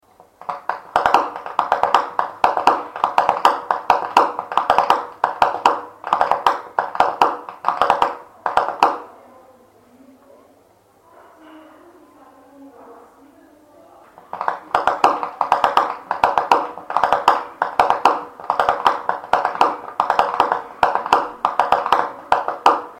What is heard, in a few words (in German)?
schnalzen; saaldorf